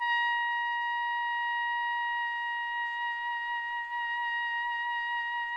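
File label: TPT, trumpet; Roland - LA Composer vol. 3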